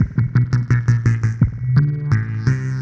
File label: Moog Passes, 170 D, modular; modular madness; 170bpm